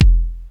kick; E-MU Formula 4000 Series Vol. 1; Hip Hop Nation; Bonus Perk

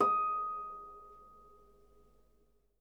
H D#6, Percussion & Harp; Miroslav Vitous

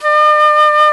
FLT FLUTE-B, Orchestral Winds; Roland LCDP04; 90 s Sample CDs; C Flutes 3-8; C Flt Sh 6&7

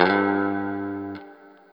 Guitar & Bass; Quantum Leap; 90's Sample CDs

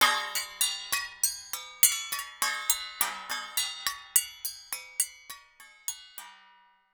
TRASHY, TRASHY METAL

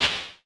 CLAP, Vermona Mono15 Drums

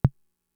Tom, Fricke MFB-522

